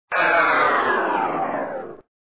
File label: Laser